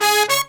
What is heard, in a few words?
Pop & Funk Brass